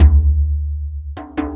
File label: Dumbek Big; Sonic Foundry (Sony Creative Software); Ambient Atmospheres & Rhythms